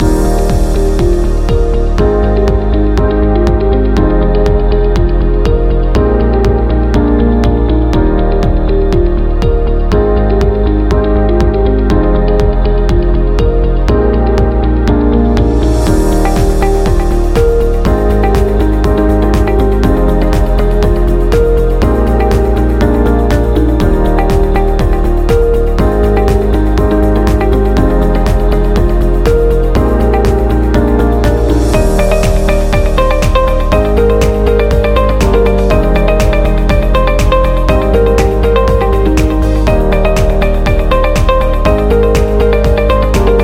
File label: Corporate Beat Loop